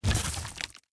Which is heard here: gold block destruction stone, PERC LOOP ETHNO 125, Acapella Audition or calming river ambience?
gold block destruction stone